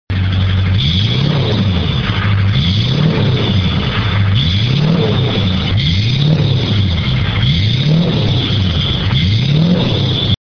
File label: 2500 HD with downpipe 6.6L Single